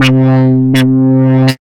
Splatbass, Wavetable, Synth Rhythmic; Instruments; Ableton; Factory Packs; Synth Essentials

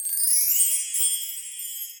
Chimes B Stick up; Latin Percussion; Drum Hits; Bell; Ableton; Factory Packs

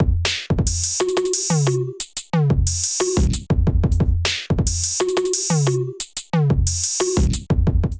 Kit, Drums; Preon Kit; Ableton; Glitch and Wash

